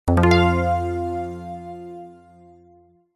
game sound bold warm 70s retro synth positive short